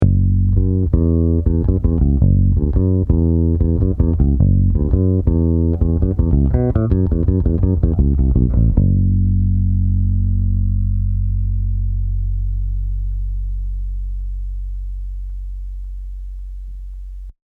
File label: Bass 10 Treble 0